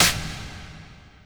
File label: snare